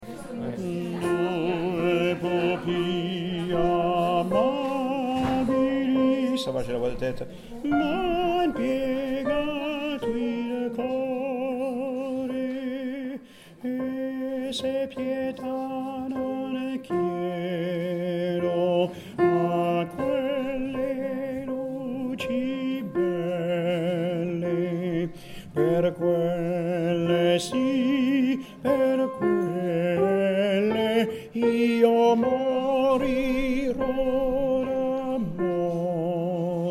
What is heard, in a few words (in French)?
nocturne tenor; mozart